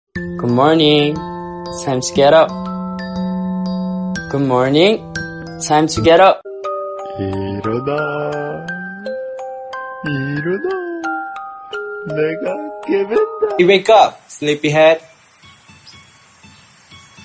english bgm

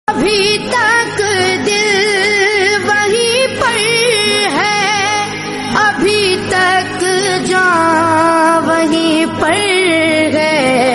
hindi song

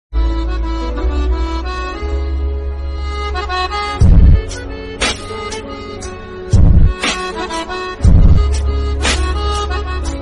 lofi background music

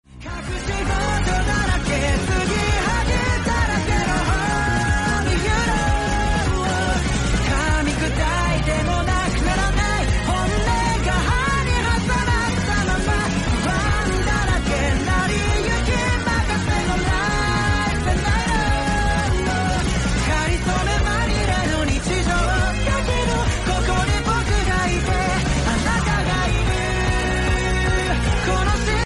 lofi remix mashup; spy x family

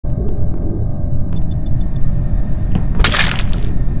kill sound

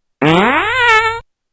fart c flat

